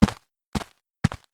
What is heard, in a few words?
walk; white chamber